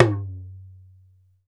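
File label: Talking Drum down; E-MU Producer Series Vol. 7 – Old World Instruments; Drums&Percussion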